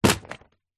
household telephone directory yellow pages drop down